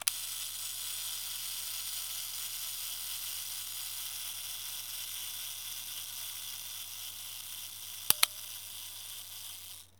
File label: Camera